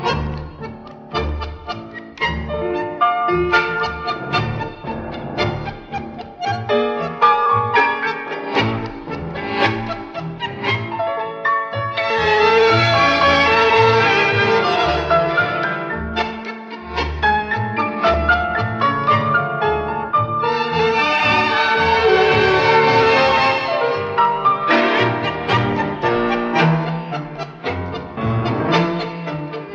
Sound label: piano according to accordion; Loops; Phonky